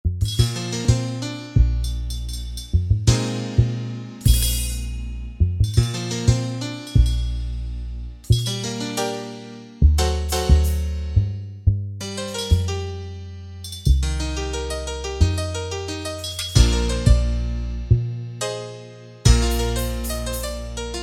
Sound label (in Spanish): salsa